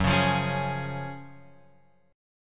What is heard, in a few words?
F sharp Major 7th Suspended 2nd, F sharp, chord; F#M7sus2 Chord {2 4 3 X 2 4} strummed